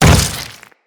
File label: Step Flesh, Footsteps; Enemies; Grineer